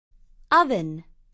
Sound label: microwave